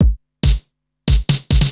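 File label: DRUMLOOP ELECTRO 140; Techno2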